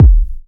steel kick drum e key, Royality free bass drum sample tuned to the E note. Loudest frequency: 91Hz; kick drum samples